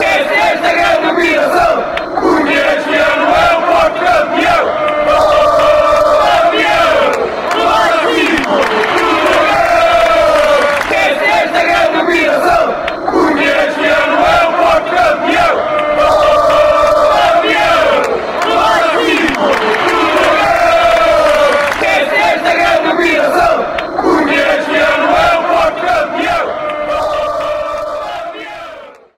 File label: ohhh lampiao fanchants